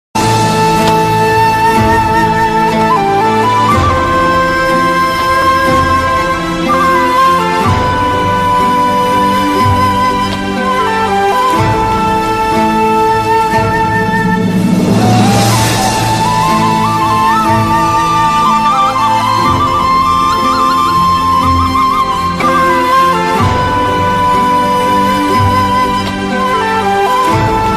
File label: ringtone flute